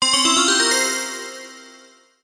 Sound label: Win; Temple of Luxor